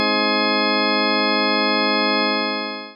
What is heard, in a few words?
octave Partials ET; third